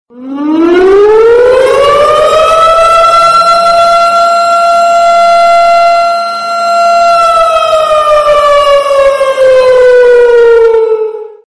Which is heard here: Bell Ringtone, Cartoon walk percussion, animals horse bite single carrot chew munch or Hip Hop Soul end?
Bell Ringtone